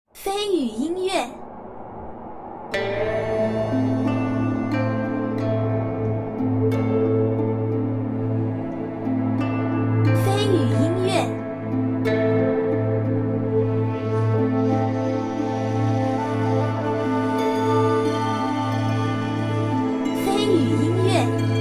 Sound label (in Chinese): 荒凉 悲怆; 玉门关